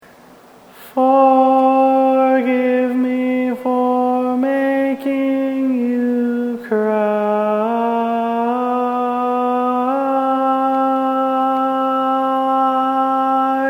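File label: Baritone, barbershop tags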